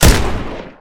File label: spas12